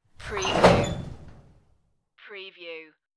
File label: open locker door; the sound pack tree